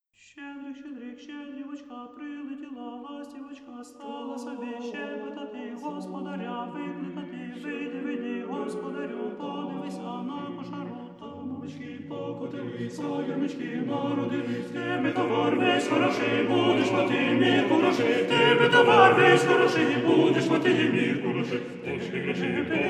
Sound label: Ukr. folk song; Tchedrik